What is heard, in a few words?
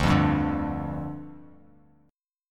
D-Minor Double Flat 5th, D, Bass Standard; Dmbb5; strummed; JGuitar